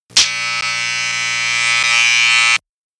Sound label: electricity